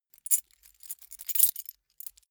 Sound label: key ring mid size rattle; objekte; schluessel